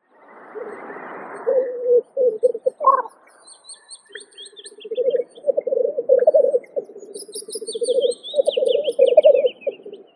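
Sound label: black grouse Tetrao tetrix, nature sounds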